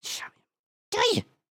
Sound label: indignant, emotes